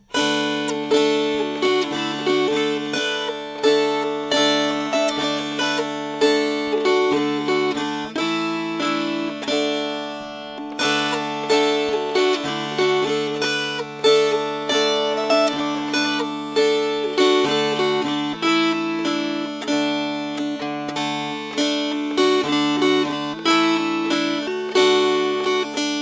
Strummed; Cornstalk Fiddle and a Shoestring Bow

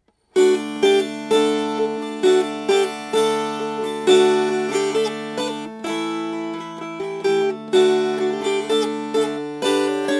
Strummed; Nelly Bly

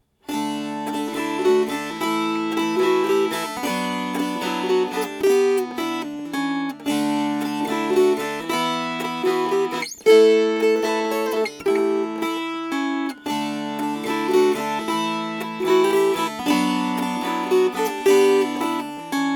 Strummed; Munster Cloak

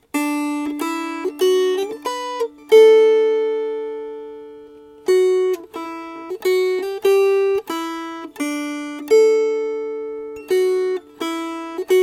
Melody

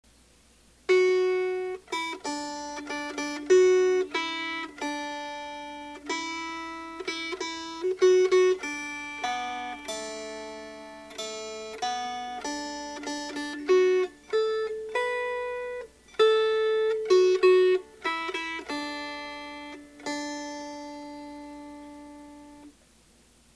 Melody

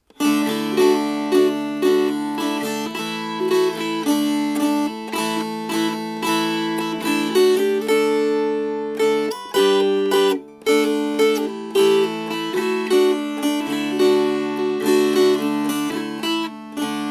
Strummed, Dulcimer

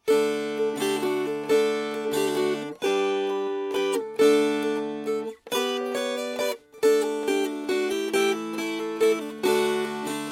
Strummed; Herr Clines Dance

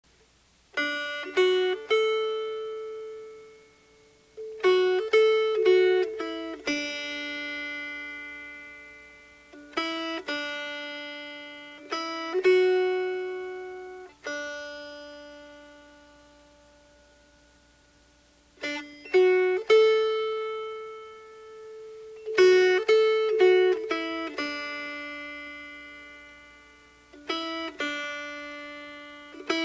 Quaker's Courtship Melody

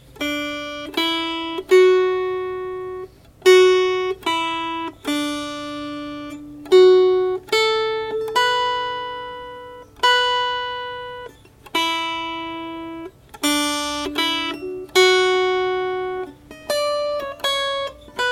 Melody

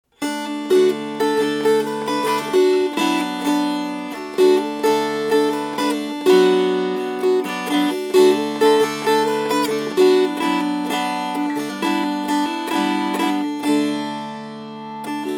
Strummed; Factory Girl

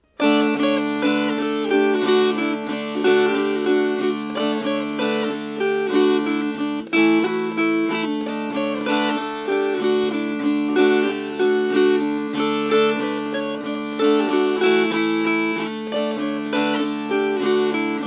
Strummed, Dulcimer

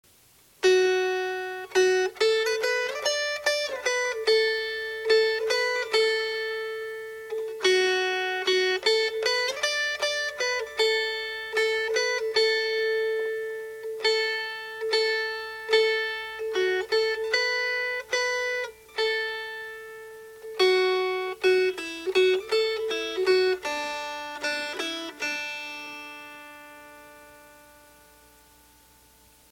Melody; Jasmine Flower

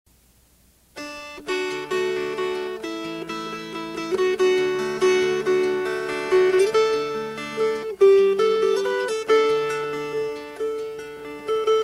Fingerpicked; Lowe Bonnie

